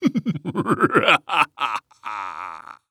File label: Cartoon Human Male Laugh Evil Vampire Laugh